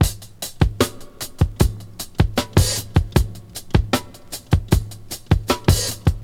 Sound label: 77 bpm fresh drum loop sample e key